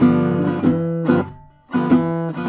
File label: Blues Guitar, RIFF